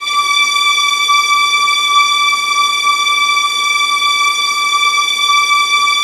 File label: VIOLINS L, Synclavier Strings; ILIO